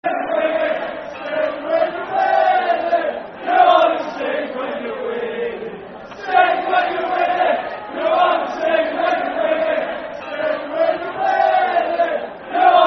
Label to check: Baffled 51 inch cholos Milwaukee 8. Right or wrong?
Wrong